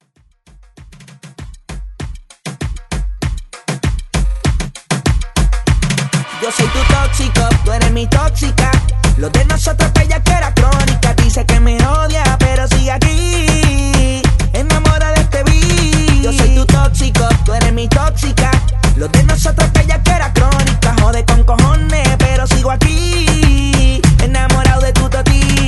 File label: Reggaeton Redrum